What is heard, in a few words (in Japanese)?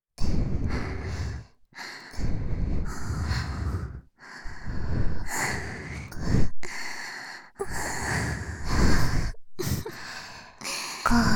両耳舐め; 挨拶からぁ本気のぐっぽり; 手コキでぇメロメロにしちゃうよ